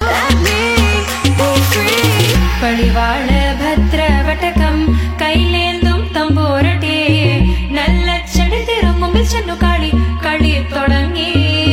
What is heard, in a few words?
Malayalam Folk Ringtone